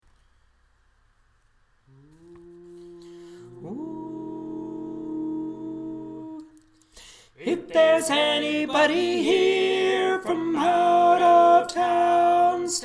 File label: Tenor